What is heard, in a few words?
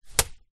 leisure juggling bean bag small land on wooden floor; zapsplat